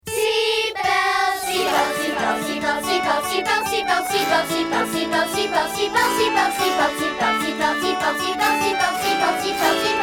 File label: irish seatbelt jig